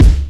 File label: boom bap hip hop kick drum f sharp key